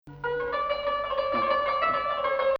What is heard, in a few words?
Ajam; 2nd Ajam 8-11